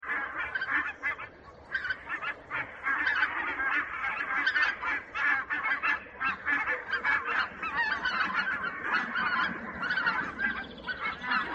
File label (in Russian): beloschekaya kazarka golos